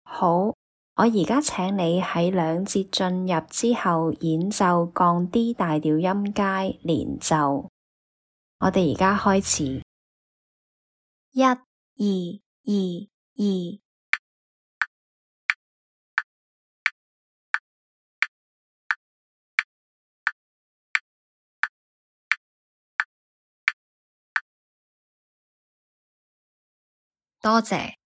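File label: D flat major scale legato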